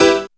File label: piano, e, Techno Piano Chords; Dance Synths